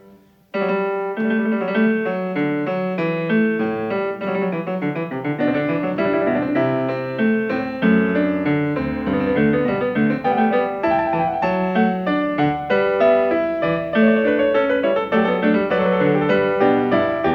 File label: Clav; Goldberg Variations, BWV 988; Variatio 10 a 1 Clav. Fughetta; Johann Sebastian Bach